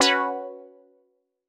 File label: Quart Stab Db; PowerFX - Nu Jazz House